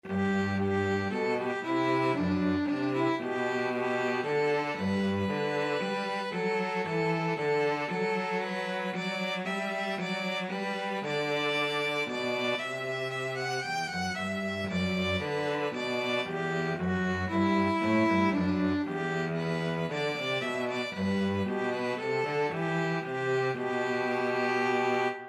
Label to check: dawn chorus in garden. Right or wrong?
Wrong